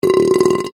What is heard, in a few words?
human burp male; Burping